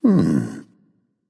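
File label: non verbal thinking, voice; cernunnos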